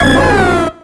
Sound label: cry